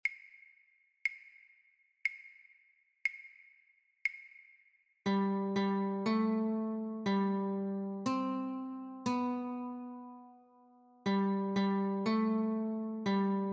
Notes Only, Guitar

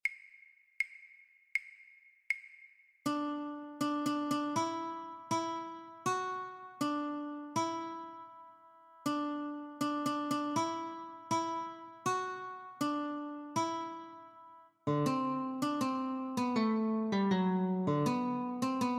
Notes, guitar; Nutcracker March; christmas

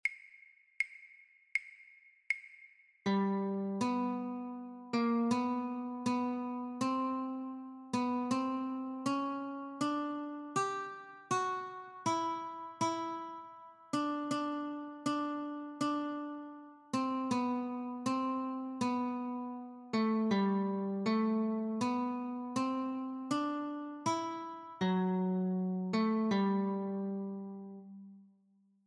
Notes, guitar

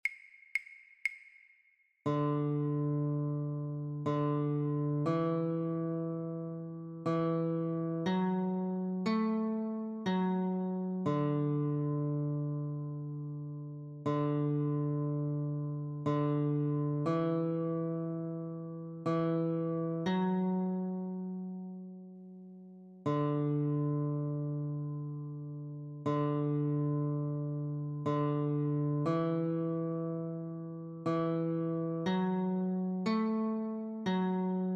Pop! Goes the Weasel, guitar

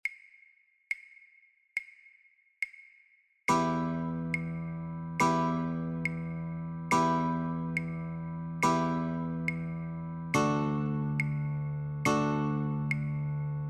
Chords, Guitar; Drunken Sailor

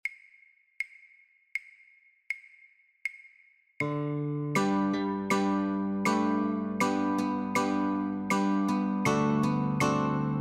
Notes & Chords, Guitar; O Christmas Tree

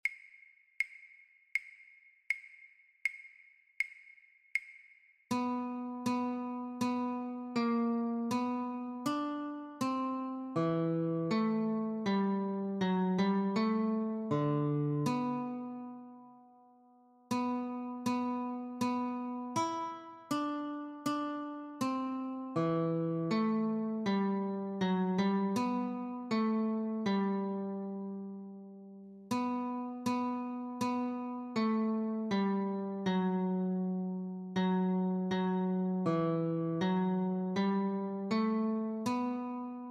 Notes, guitar; christmas

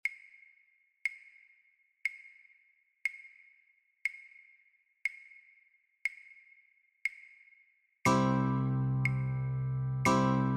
Chords only, Guitar

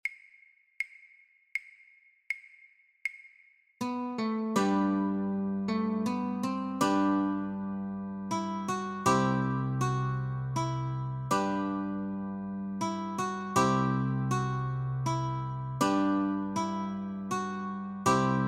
Notes & Chords, guitar; christmas

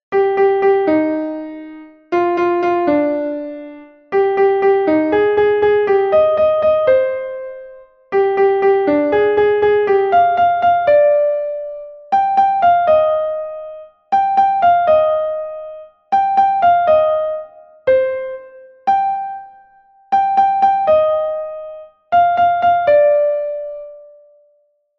Symphony No. 8, Classical Piano

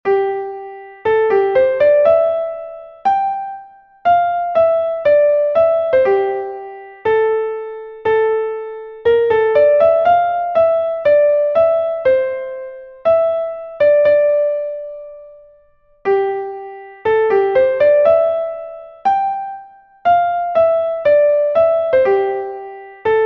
Fantaisie Impromptu, classical piano

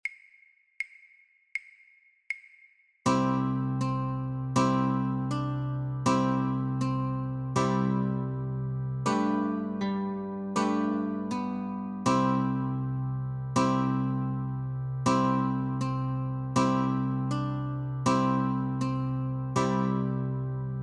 Notes & Chords, Guitar; Good King Wenceslas; christmas

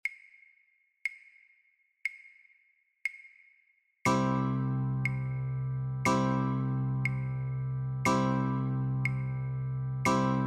Chords only, Guitar

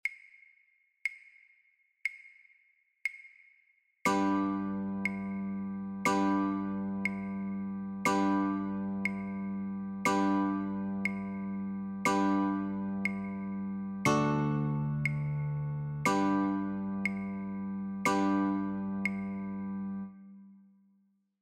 Chords and Claves, Guitar; Hot Cross Buns

